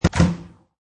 Kitchen Cabinet Door Open, Single bang as a cabinet door opens; Cabinet Door Sound Effects